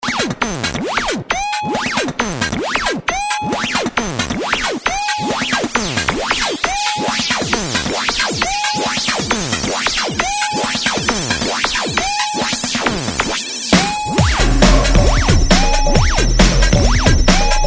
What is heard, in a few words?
Squeaks And Bleeps